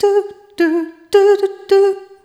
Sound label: Rhythmic G